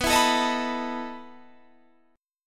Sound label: E Major 7th Suspended 4th B